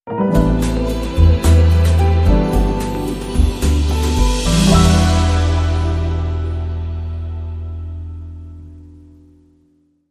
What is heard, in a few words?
Long Sting